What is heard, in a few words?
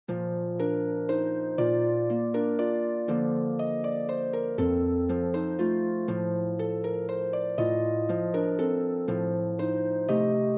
Harp Waltz